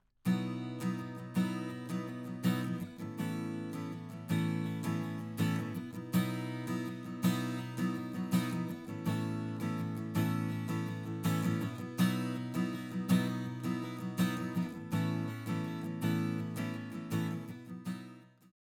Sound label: strum; AKG 414B ULS